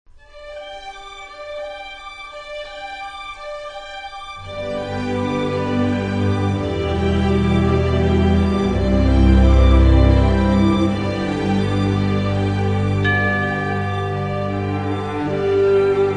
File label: Orchestra